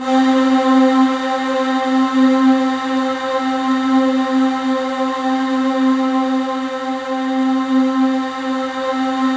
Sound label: C4, Synth Leads